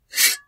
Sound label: plates Slide; Kenney's Sound Pack; Foley Sounds; Plating